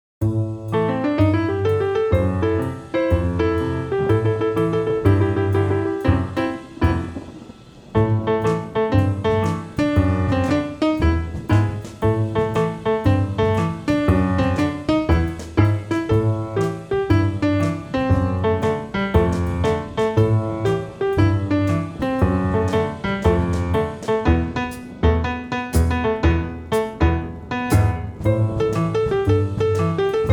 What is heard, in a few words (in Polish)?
instrumentalna; EduMuzArte; Gamoliny na pieciolini; wersja instrumentalna